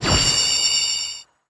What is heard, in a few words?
Laser wave